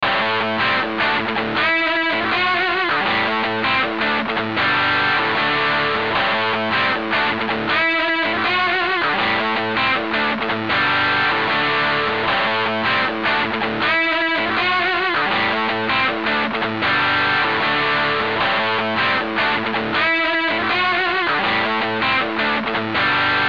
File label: dist plus